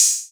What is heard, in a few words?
Open Hat, Drum One Shots; Vibe; Hip Hop